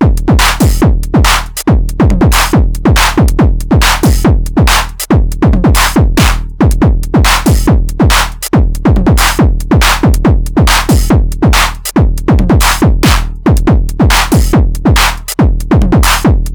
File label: heavy